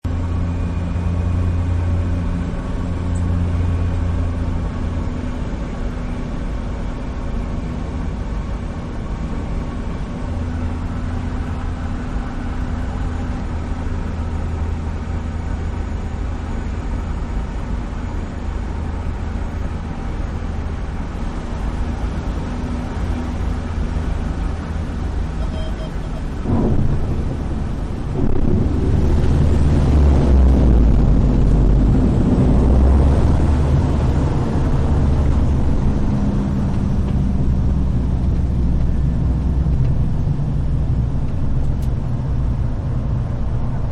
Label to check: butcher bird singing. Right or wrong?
Wrong